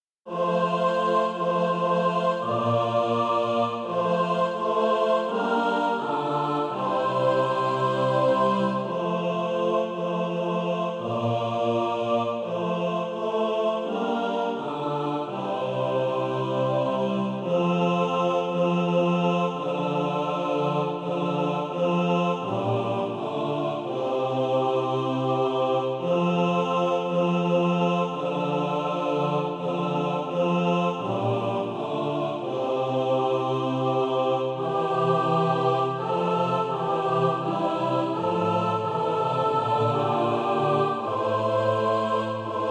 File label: mixed